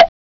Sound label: mokugyo